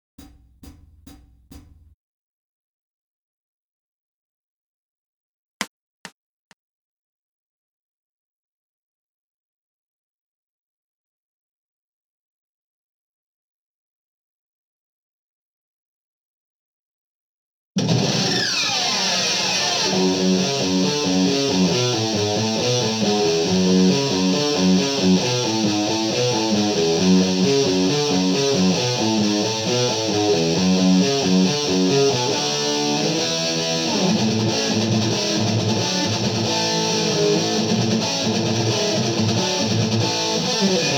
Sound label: Guitar Backing Track Guitar only